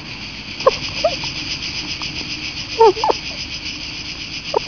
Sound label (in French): lapin